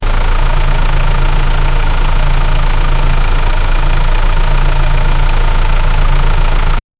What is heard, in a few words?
the sound of the mercedes, automobiles